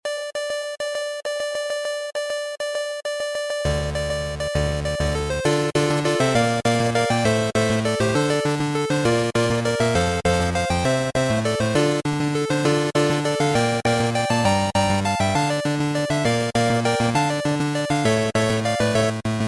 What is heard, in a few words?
midi